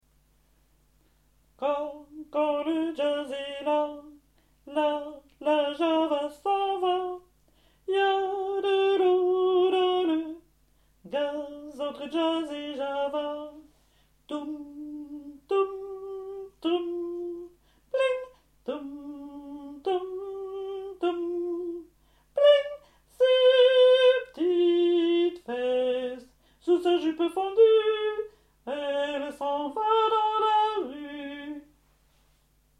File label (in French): hommes